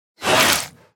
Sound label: Spider Spitter Scream Ending Combined; Deep Rock Galactic Boardgame